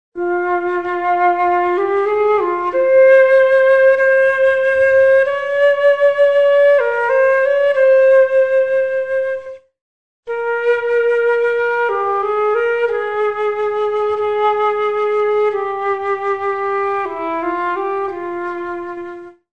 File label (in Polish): flet poprzeczny